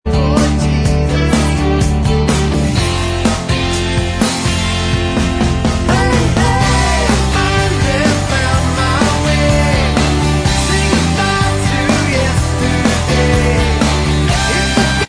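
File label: backing vocals, backing track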